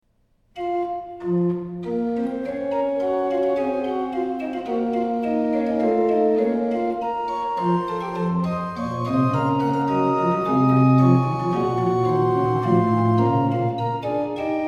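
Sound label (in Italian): fuga in D, harpsichord & organ; telemann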